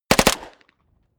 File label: smg uzi 45 close